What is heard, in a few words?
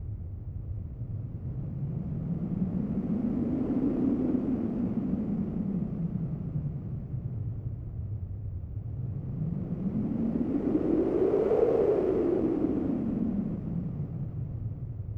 wind on the wasteland synth loop